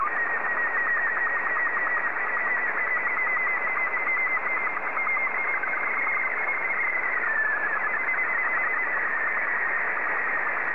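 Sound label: Robot 12 black and white, slow-scan television; JI3QJQ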